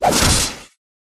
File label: bug attack